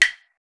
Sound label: Ban Gu, Roland BK-5 Percussion